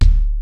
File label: XD-5, DRUMS PERC, BOSS; Rubber Chicken Software Sample Bank